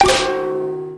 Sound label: metal man damage